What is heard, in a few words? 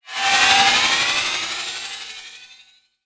Oscillating Sci-fi Transition